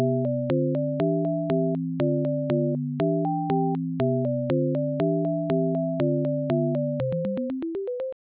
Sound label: Mary Had A Little Lamb